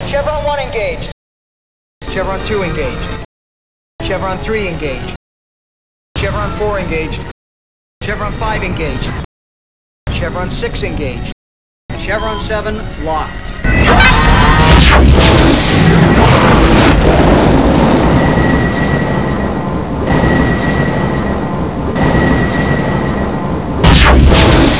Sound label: gate; tfc